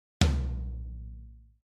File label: floor tom